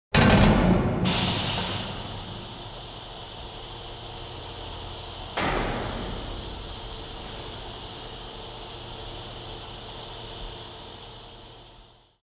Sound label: Hydraulic door